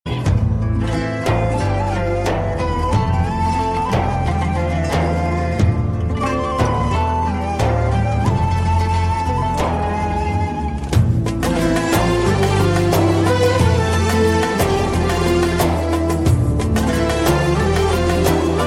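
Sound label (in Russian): грустная арабская музыка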